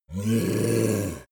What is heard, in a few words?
yeti growl warning tense; kreaturen